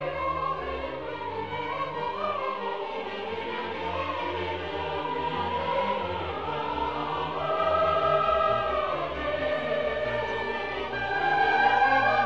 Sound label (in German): Messe g-moll, mass in G minor; bwv 235